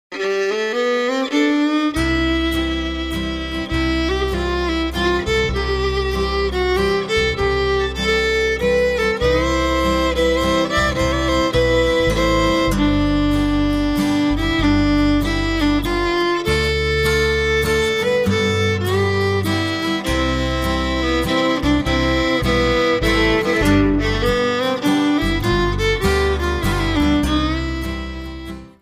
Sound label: waltz